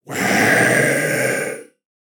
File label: Skeleton Warrior Attack Vocals